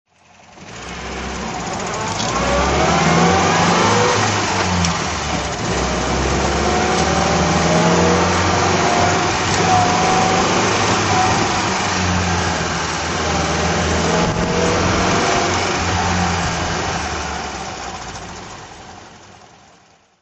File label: drive; mgva 1938